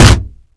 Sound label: wood impact, Bullet Impact; Dystopia; physics